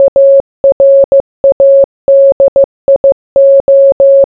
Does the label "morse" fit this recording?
Yes